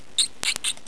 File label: squirrel; moSounds; MacOSX